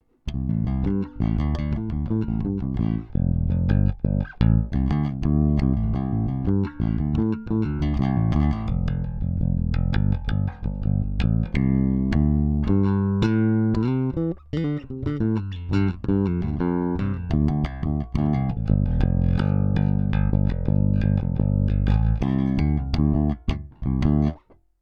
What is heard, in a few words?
bass sample; RMS